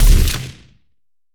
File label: Sci-Fi Weapons-Assault Rifle-Single Shot